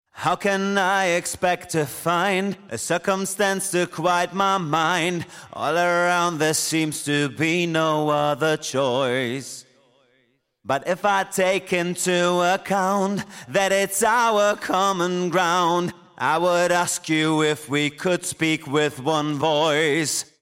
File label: B Vox Male DRY